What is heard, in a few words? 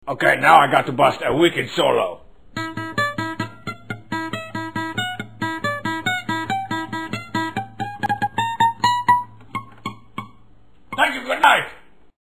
wicked solo